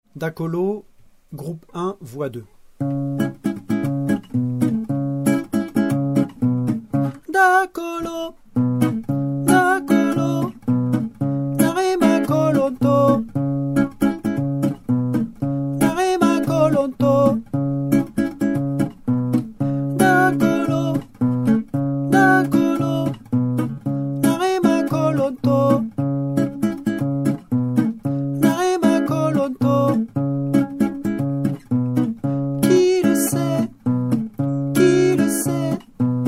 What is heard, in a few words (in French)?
Voix